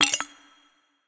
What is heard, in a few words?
glass clank